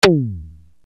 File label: RX7 ELEC TM2, Yamaha RX-7